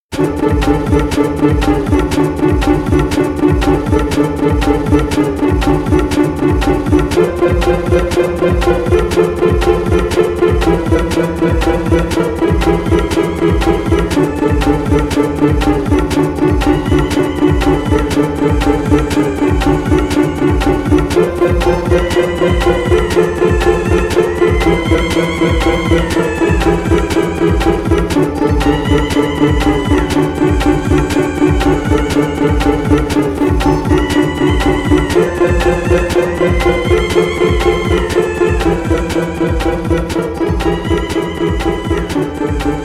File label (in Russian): Голос за кадром (инструментал)